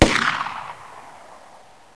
PISTOL

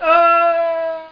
Ahhhhh!; Games World; Shareware games; Triple-A 1.6

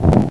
Plant Approach; Curse of Dragor

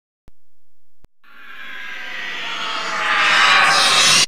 ZAP

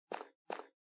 steps conc; Rapid Gun; ipsc

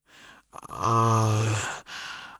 groan; Singles Flirt Up Your Life

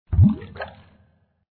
blub; Fische Versenken

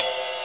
drums ride

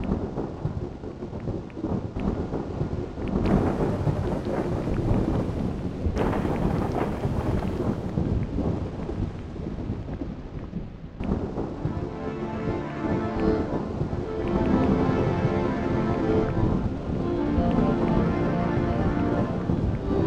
pouring rain

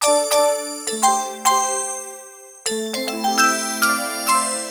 Synt; SMOOTH 03 C

